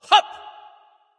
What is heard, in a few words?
grunt attack; smite; mercury